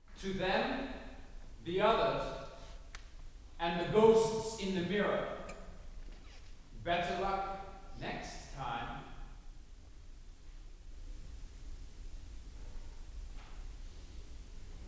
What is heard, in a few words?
piano trio